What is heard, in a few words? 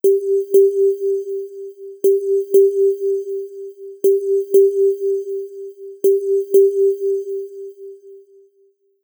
Echo